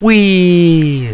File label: wee